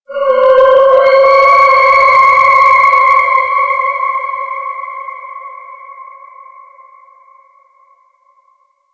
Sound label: Space Exterior Dist Call, Space Battles, Ambience; Warframe